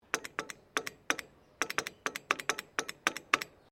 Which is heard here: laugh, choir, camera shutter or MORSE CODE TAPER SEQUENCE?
MORSE CODE TAPER SEQUENCE